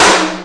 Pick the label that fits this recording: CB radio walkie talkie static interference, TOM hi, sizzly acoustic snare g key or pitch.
TOM hi